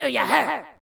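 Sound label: men yells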